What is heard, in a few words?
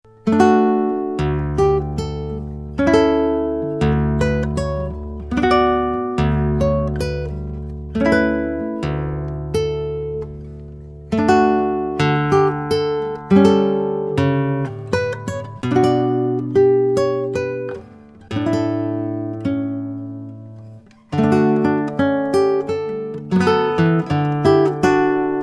Catalonian Song, guitar